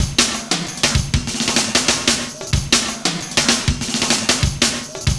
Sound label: ACTIVE FILL; Univers Sons - Kitch 70s